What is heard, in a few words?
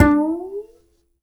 Upright Acoustic Bass; Larry Seyer; SWITCHABLE POSITIONS